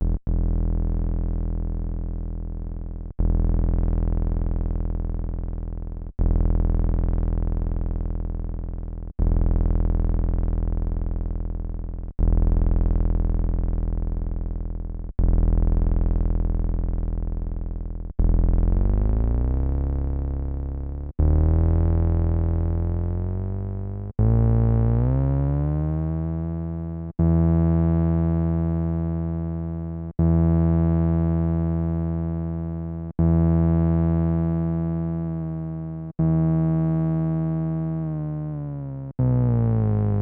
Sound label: Korg Nubass SQR Pitch Sub Sat